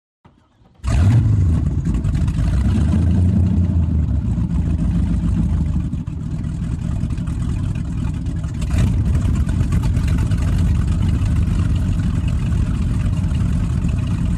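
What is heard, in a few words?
Power Boat Interior Start Idle, off shore power boat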